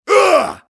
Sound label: mobster pain sharp